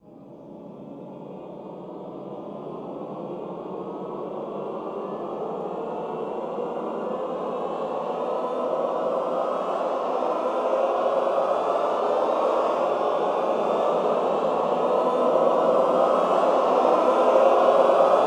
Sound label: ENDLESS, Classical Choir, ENDLESS GLIS; Best Service; Extended Classical Choir